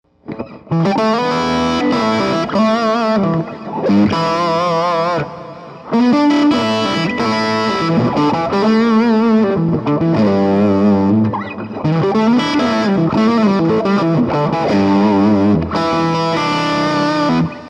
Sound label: Bridge gain, guitars